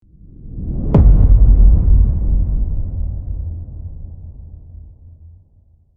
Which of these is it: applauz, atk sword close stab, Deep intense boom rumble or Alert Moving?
Deep intense boom rumble